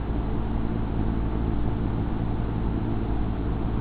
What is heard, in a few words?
fan; de dust2 thera